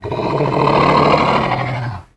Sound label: moan; were